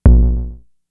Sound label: FX, Drum Machines; Fricke Schlagzwerg